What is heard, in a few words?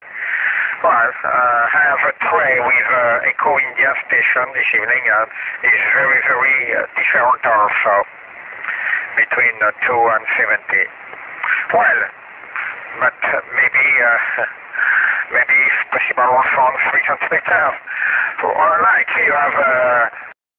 Tropospheric Propagation, 144MHz; in France; over a distance of almost 1000kms; 59 for hours; 2m